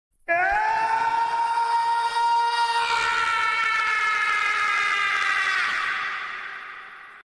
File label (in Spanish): el grito